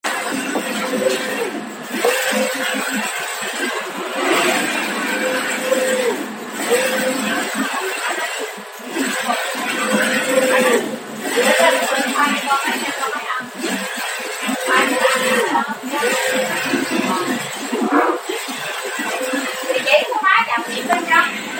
máy in printer

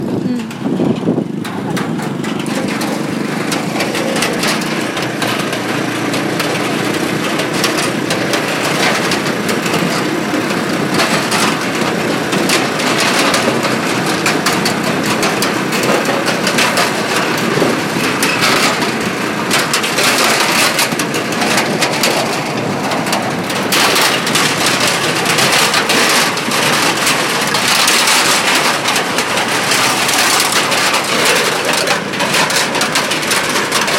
Metallic bridge; Cầu cẩm kim

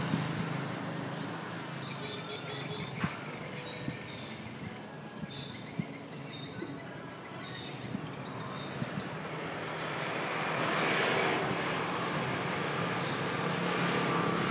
Tổ yến Birds

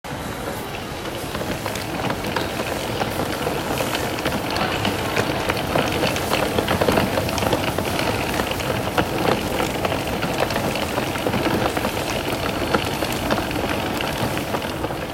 Wheels

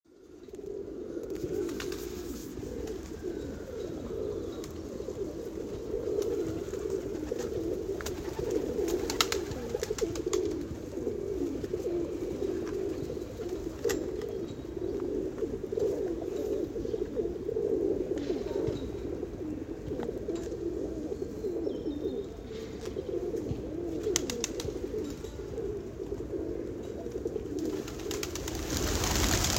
Large group of pigeons mating sounds

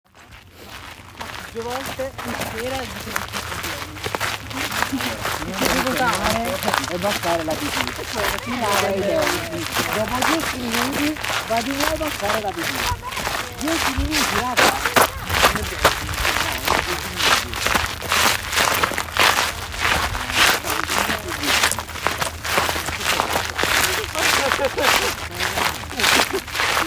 Walking

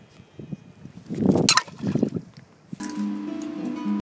Plate dropping in water; Tiếng cái dĩa vỡ vụn trong nước

